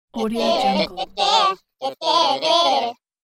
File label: cartoon group winning singing shout